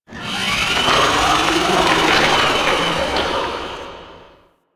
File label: Corpus Ship Metal Torture; Ambience; Warframe; Corpus Ship Remaster